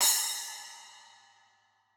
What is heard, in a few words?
Drum Cymbals A